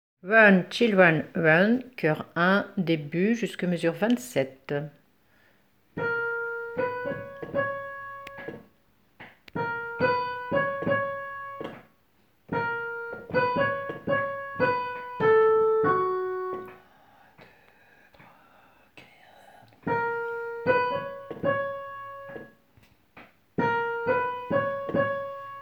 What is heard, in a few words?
choir; Run children run